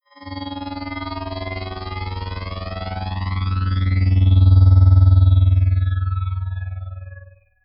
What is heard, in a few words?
Future Telemetry Deep Simmering Orb Sweep Spaceship; Sound Design; Robot Sound Effects